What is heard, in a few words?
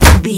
punch heavy huge distorted